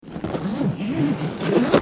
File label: Luggage Zip